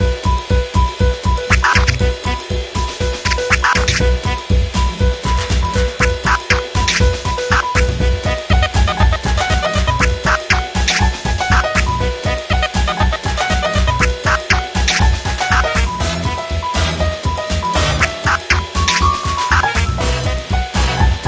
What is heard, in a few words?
Re blues